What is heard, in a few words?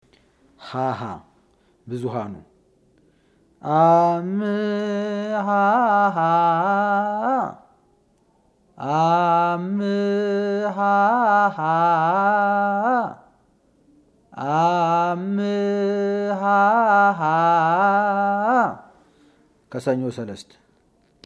haha